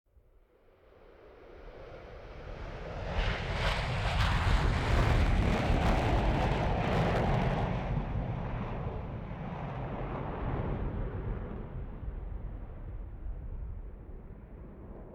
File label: Jet flyby; KMP Film Video Sound Effects